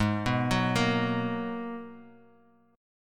Ab Minor 9th down Guitar; Abm9 arpeggiated

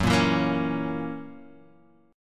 F sharp 7th, Guitar, Half Step Down

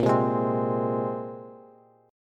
Bb 7th Suspended 2nd down, Bass; Bb7sus2; strummed; JGuitar

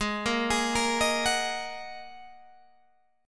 Ab 7th Suspended 2nd Ab, Guitar Open G; 18,15,18,15,16,16; arpeggiated

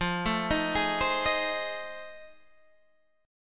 F 7th Sharp 9th, Guitar, DADGAD; F7#9 arpeggiated

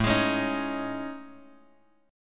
A Major Sharp 11th, Bass Standard; AM#11; strummed; JGuitar

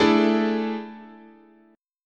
A Major 7th Suspended 4th, guitar chord

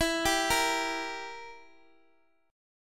E Diminished E down; Edim arpeggiated; JGuitar

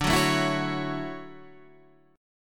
D-Major 9th D

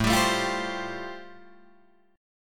A-Minor 7th Sharp 5th, Guitar Drop D, chord

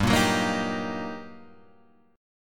G Minor Major 7th Sharp 5th, guitar chord; GmM7#5 Chord {3 1 4 3 4 3} strummed

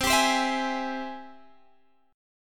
G sharp Augmented Major 7th C

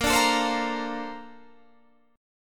A sharp Major 9th, Guitar Drop D

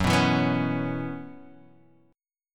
Gb 7th Suspended 4th Gb down Guitar Open F, chord; Gb7sus4; strummed; JGuitar

